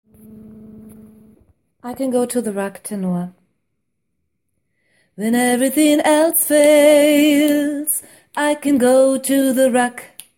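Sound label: I can go to the rock tenor, gospel choir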